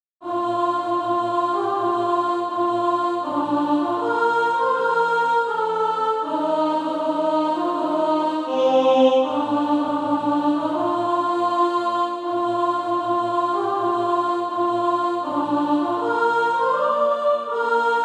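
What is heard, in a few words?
soprano, Practise Music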